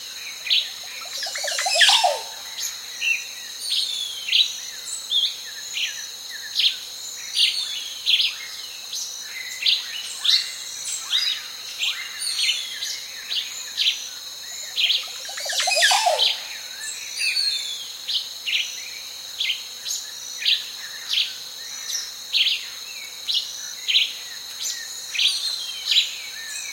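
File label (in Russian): чёткие звонкие разноголосые звуки пения птиц птиц на озере на фоне спокойного сверчания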